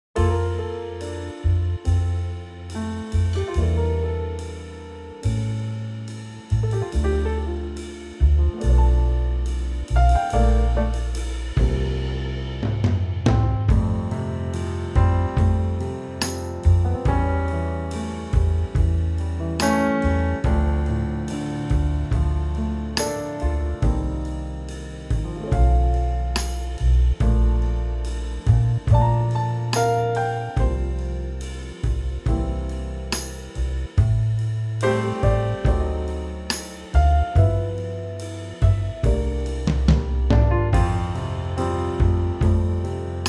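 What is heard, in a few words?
F trio, backing track; key - F - vocal range - C to A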